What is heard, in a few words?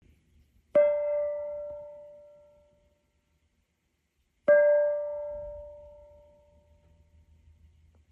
gong mandala; serenity prana gong mandala 15cm